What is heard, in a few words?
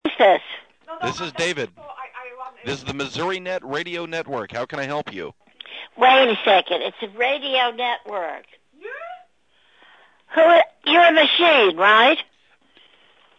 MACHINE